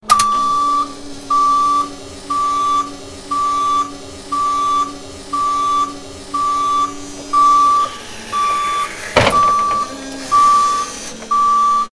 Hoist Boat

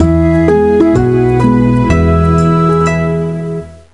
Celtic Win; Mystery Tales: Time Travel; NDS

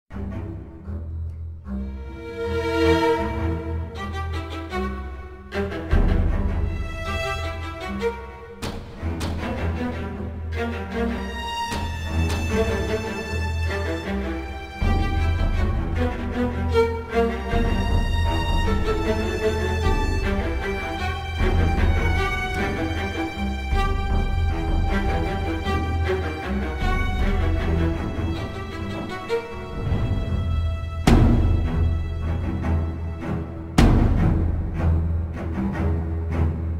Ambience Suite; Ravencroft; The Amazing Spider-Man 2

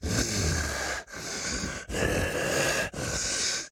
Walk Cycle Burns; Devour; The Town

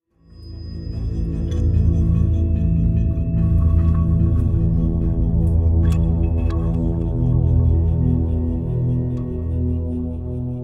sting Demons; the walking dead michonne 2 give no shelter

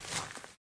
Footstep Gravel; this is the zodiac speaking 2020 gamerip switch ps4 xbox one pc macos

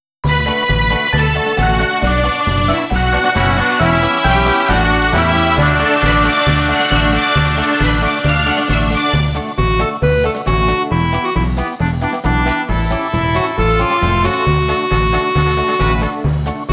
Anthem (Instrumental); Soyokaze High School

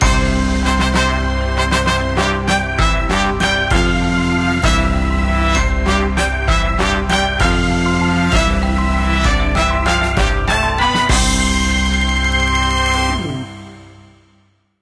Quest Stinger Intro; club penguin island; android

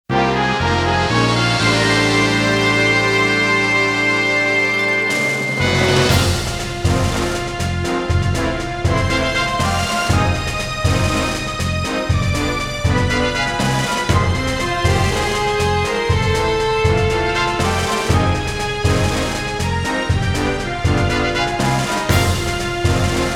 Shock; Wild Arms Alter Code F

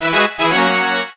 Level Up; pangya fantasy golf pc

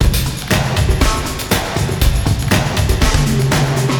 Drum test wet; Nimbus Station; lego universe macos windows gamerip 2010

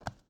Walk; Rebel

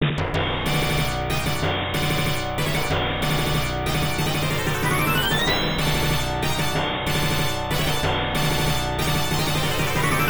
Mid Boss; Three Wonders; arcade; Midnight Wanderers; Wood Golem

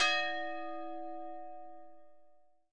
Extinguish End; virtual city windows gamerip 2009

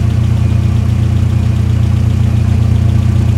Land Cruiser Idle; car simulator 2

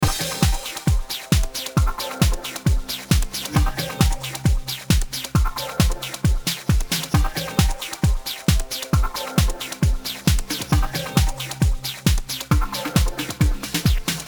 Techno; Color Cross; nds gamerip

